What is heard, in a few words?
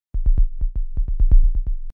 sine